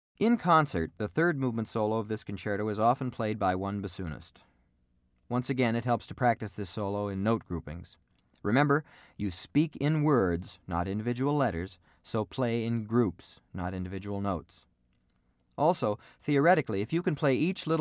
Piano Concerto In G, Orchestral Excerpts For Bassoon